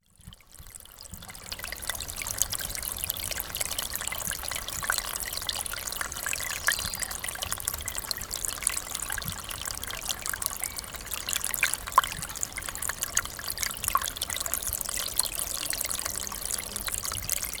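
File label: murmuring of a forest stream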